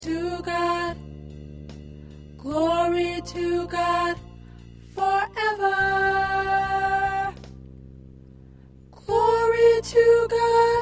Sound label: Soprano